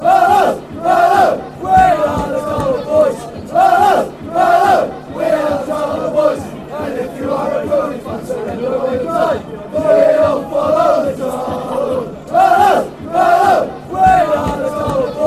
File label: hello, fanchants